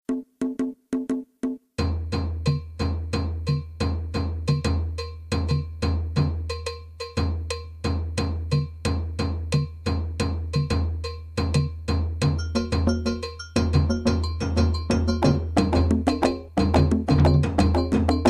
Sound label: KONKOBA DUNUNBA